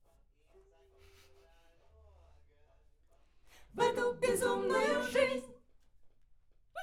Backs AKG LEFT

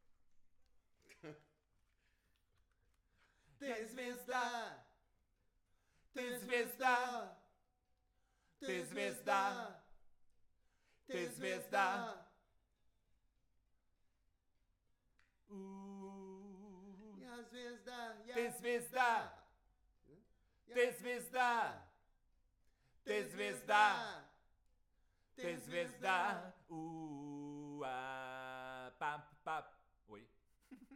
Voc

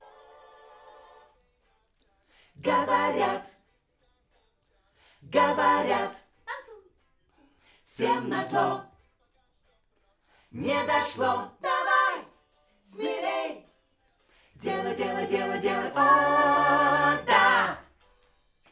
Backs AKG

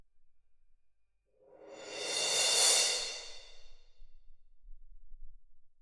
Perc

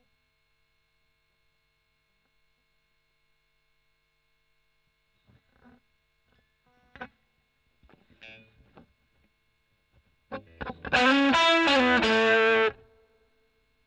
E.GTR OD; Gefell

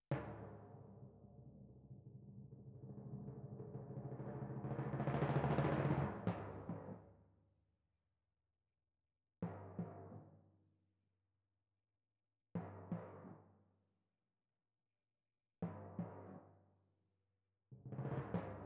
Timp Close

